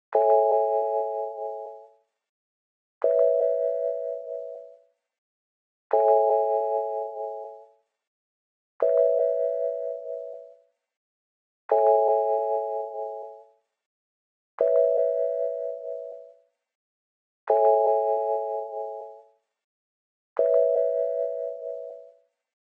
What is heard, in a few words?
166 BPM C# Min Lead; Loop Stems; Cymatics; Fallin 4 U